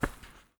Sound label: Cover Open; This Is the Police 2